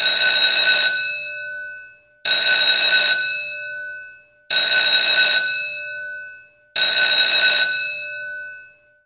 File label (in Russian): zvonok telefona